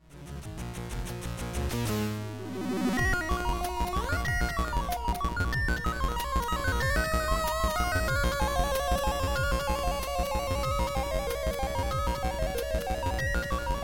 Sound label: Good C64 Sound; stay a while